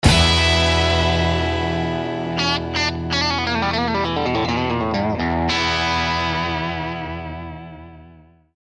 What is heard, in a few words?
Blue Suede Shuffle